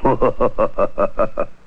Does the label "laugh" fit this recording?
Yes